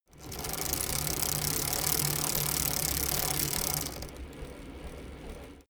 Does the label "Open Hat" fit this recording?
No